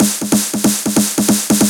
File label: Fill 140 BPM